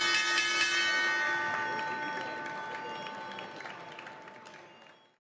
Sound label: Opening Bell